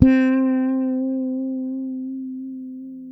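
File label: fret finger C, Pedulla bass; Masterbits CLIMAX 7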